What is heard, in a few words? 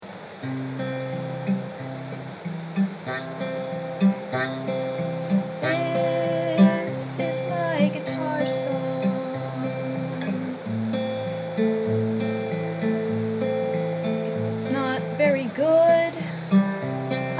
pitiful guitar